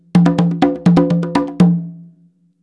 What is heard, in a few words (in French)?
frise, congas